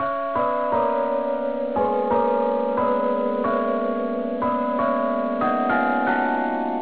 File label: cork, carillons